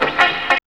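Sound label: GTRRIF, guitar riff; E-Lab; X Static Goldmine 1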